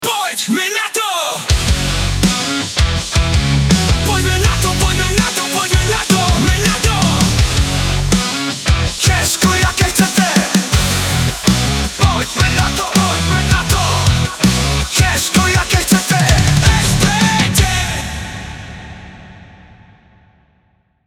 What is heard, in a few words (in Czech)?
jingle